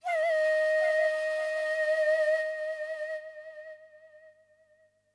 FLUTE ETHNO 160 C

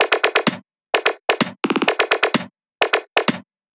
perc 128, Electro percussion loops; Anthemic

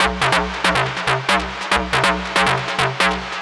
TECHNO EXTRA 140

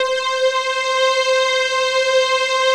PAD

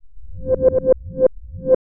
HOUSE FX 125

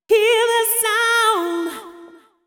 deep house female, 125, A, vocals

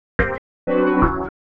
house synth 125 A, keys

